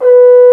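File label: French Horn p B3; Digital Sound Factory Virtuoso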